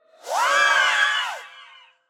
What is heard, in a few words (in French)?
Banshee Cri; Phasmophobia